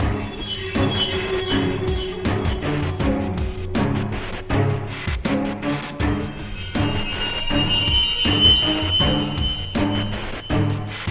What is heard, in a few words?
Dangerous